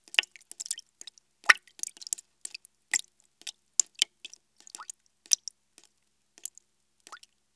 water drip pool

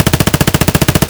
kedr loop close; Escape from Tarkov

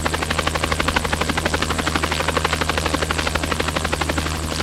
mi35m engine outside engine onload; War Thunder

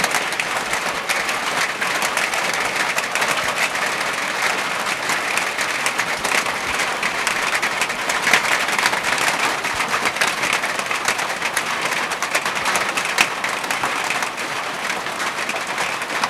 Amb Weather; Red Orchestra Ostfront 41-45

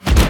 depth bomb; World Of Warships; weapons

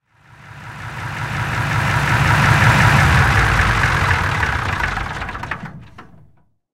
su 100 engine stop, tanks; War Thunder

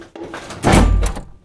Vehicle Hatches; Red Orchestra Ostfront 41-45

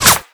Projectile Sounds; Red Orchestra 41-45

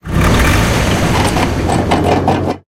tank engine int load, engines tanks; War Thunder

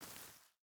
footstep barefoot grass walk; Rust

